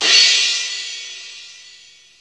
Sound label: CRASH M1, DRUMS; East West; Global Sounds